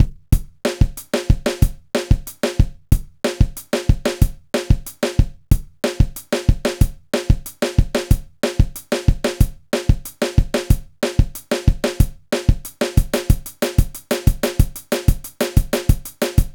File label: drum